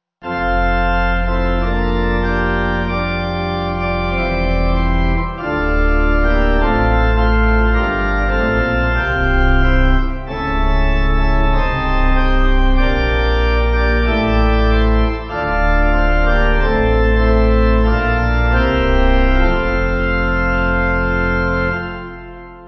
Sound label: Pipe